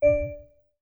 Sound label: Class Harp, notifications